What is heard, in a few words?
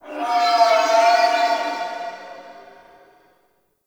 Gas Dist Energy One Off; Warframe; Corpus Gas City; Ambience